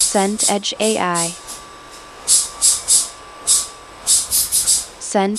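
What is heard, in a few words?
ambience office open plan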